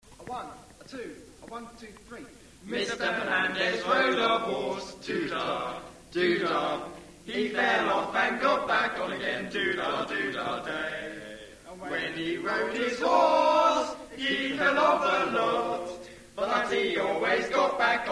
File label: Horse